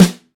low tone snare drum f key, Royality free acoustic snare sound tuned to the F note.; snare drum samples